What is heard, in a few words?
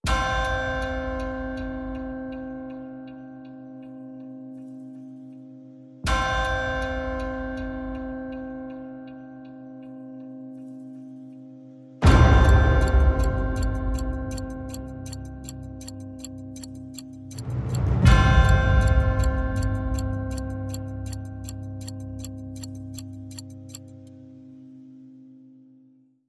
Clocklike C